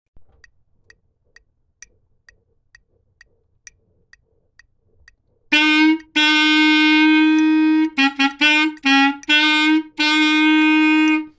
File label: Bass Boogie Melody 130BPM